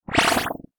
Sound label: Flash laser